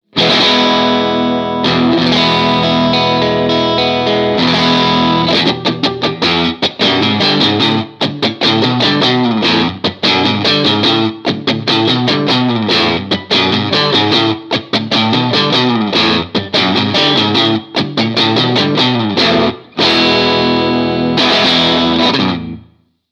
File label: BRITISH DRIVE; Helixシリーズのマルチエフェクター